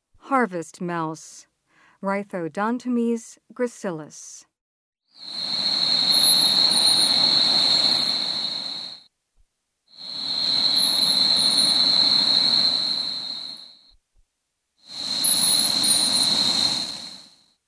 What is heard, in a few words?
Harvest Mouse - Wild, Mammals of the Rainforest; S. America